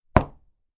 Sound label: stamp